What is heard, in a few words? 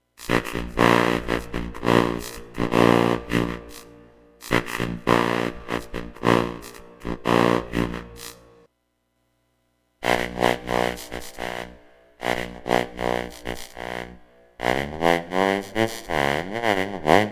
internal oscillator, vocoder; analog synth